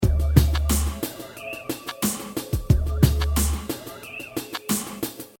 Hip Hop Drum Loop 90 Bpm Straight With Screech; Everybody Now Straight With Screech; Royalty Free Drum Loops

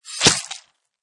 Knife Slide And Stab; Lynne Music

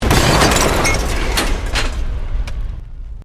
Explosion Medium Metal Glass

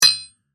Metal Hit Spring; Metal (Sound Effects:Materials)

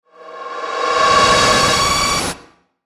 Eerie Reverse Swell Feedback Static; Warped

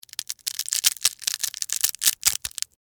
Crunch Ramen Noodles; Texture; Crunches; Objects; BlastWave

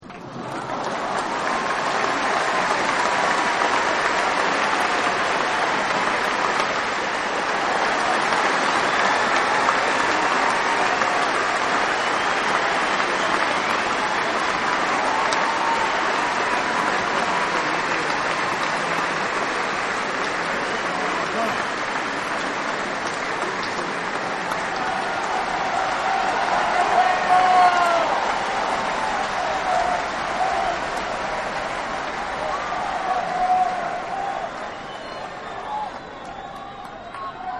Stadium Applause; Human

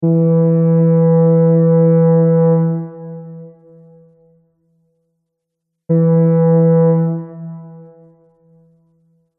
Ship Horn 2 Deep Sounds; Boat Sound Effects

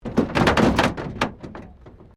Wreck Door Panel Clunk Metal

